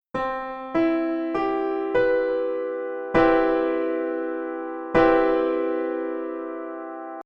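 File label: Guitar CM7 piano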